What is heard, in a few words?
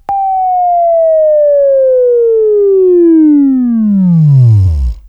WAV Left, Sweeping; Sweeping Training program